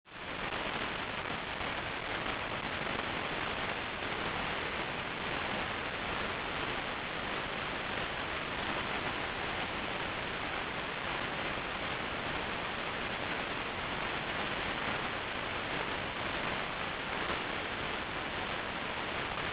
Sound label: noise; speaker recognition py